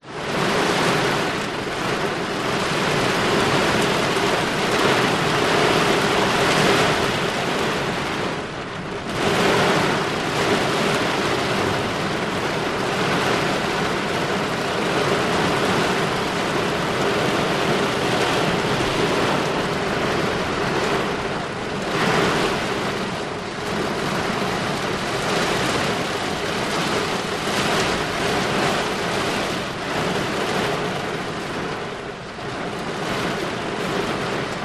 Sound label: nature rain heavy windy in car perspective; zapsplat